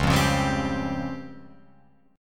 Db Major 7th Flat 5th Db down Guitar Open F; strummed; chord shape; JGuitar

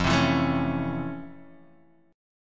F Major 7th Flat 6th, F, guitar

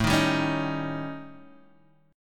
A 7th Flat 5th Guitar Half Step Down

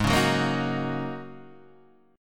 G sharp 9th Suspended 4th G sharp; G#9sus4 Chord {4 4 4 3 4 2} strummed

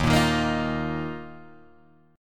D sharp Suspended 2nd D sharp, Guitar Drop D; chord shape

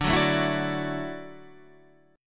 D-Major Add 9th D down Guitar Standard; strummed; JGuitar

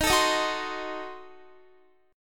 Eb Diminished 7th Eb, Guitar; Ebdim7 strummed

